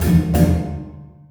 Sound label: MALAY PHR L, Heart of Asia; Spectrasonics - Heart of Asia CD2